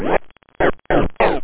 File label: transit, scratch; da capo vol1